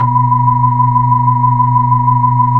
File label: B-3, Hollow; Roland LCDP10 Keys of the 60s and 70s 2; Hollow Xfade